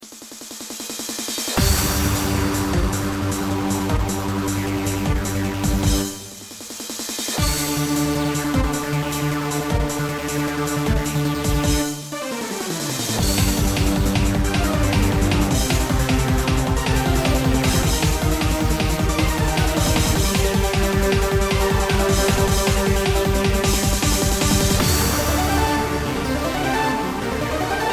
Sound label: PROGRESSIVE BAROQUE